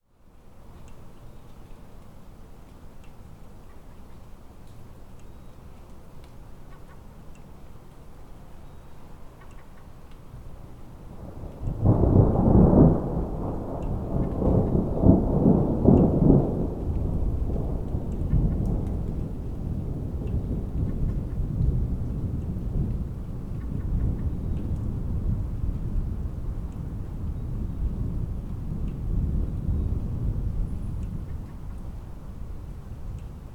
weather thunder distant urban distant traffic

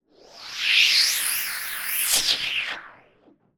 sound design transition filter pass sweep delayed

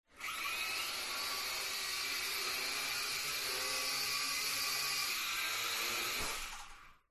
Drone Falcon; fox audio

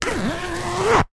plastic art folder zipper

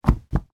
sport swimming goggles put down on carpet

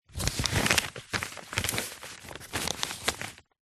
foley paper sheet a4 folding

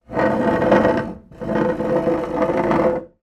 household chair wooden drag hard floor; zapsplat

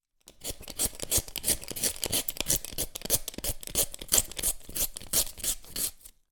peeling potato with manual peeler fast

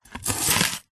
food capsicum pepper chop with knife

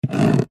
office handwriting pen draw line on cardboard box

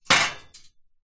metal frame short sharp movement rattle

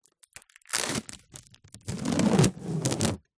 office sticky tape peel from wood

